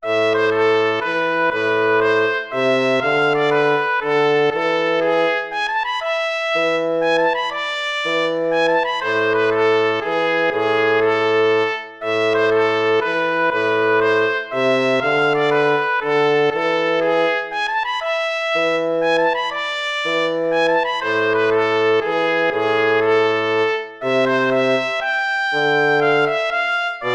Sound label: baroque minuet minor